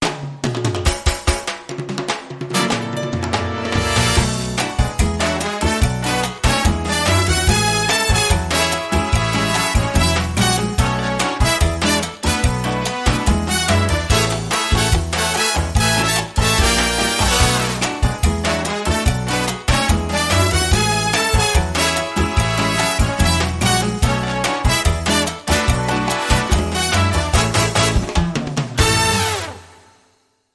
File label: Cha Cha With Me